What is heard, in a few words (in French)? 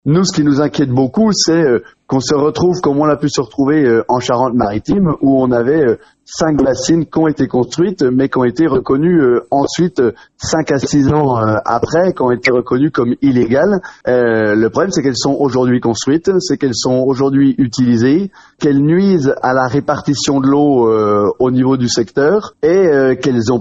manif bassines; L’appel à une forte mobilisation contre le projet de méga-bassine à Sainte-Soline